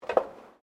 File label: FOOTSTEPS WOMENS HIGH HEELS ESCALATOR STEP; Footstep Foley; Human